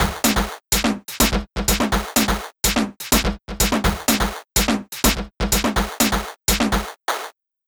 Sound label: Italo Beat